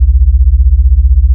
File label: 63Hz